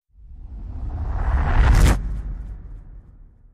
crescendo

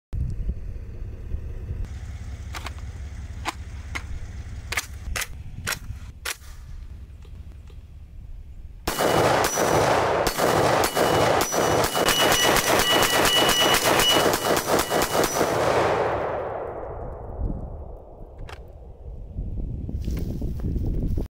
Steyr AUG; اسلحه اشتایر آاوگ

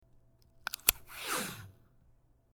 Pulling Office Tape

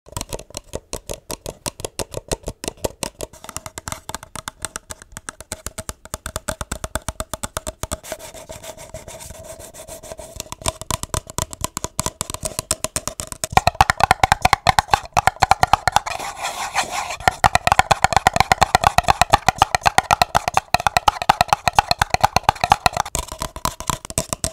paper cup

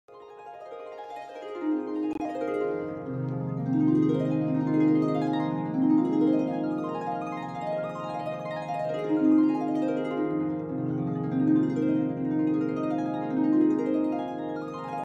harp

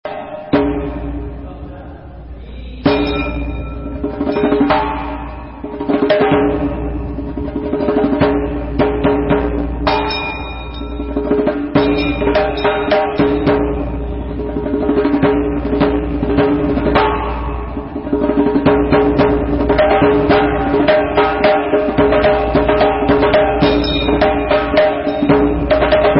Zarb Zoorkhane

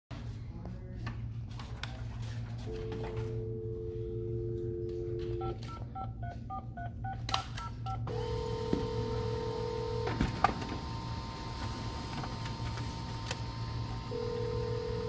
fax machine; دستگاه فکس

